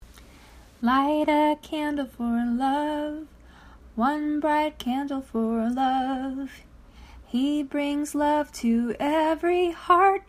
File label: Advent Candle Song